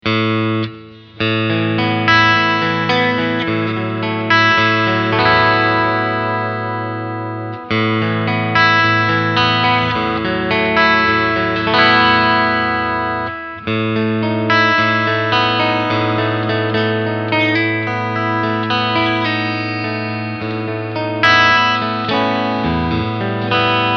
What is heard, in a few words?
Clean Picking Mittel SC